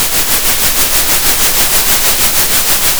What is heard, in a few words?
center, Sound of a train